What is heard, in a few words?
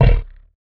Bass snort